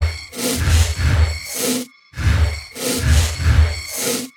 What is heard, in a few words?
Loop 110 bpm, Stretchmangled; 99Sounds; Mangling Audio